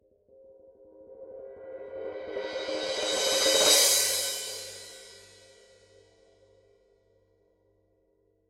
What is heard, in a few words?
brass 16 crash roll